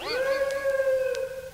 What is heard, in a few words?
woo whispery; ooh ahh; ho hoo woo